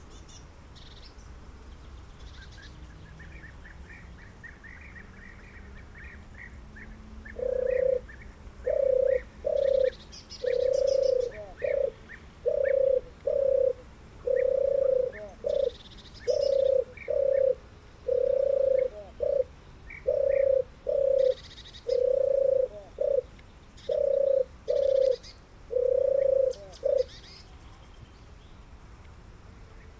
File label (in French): tourterelle